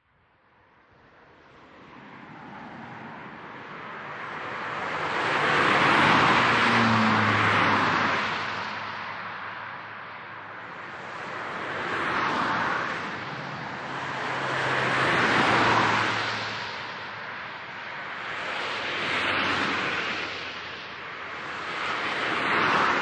Medium Highway Traffic, Motorway, highway or freeway; from a perspective close to the traffic. Cars moving by